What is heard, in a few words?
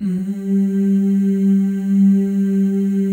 FEM, Symphony of Voices; Spectrasonics